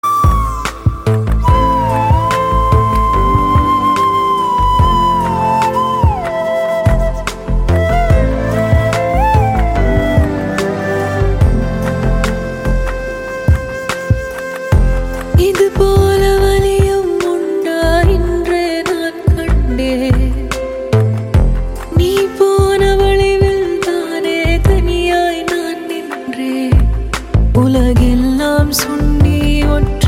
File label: Ringtone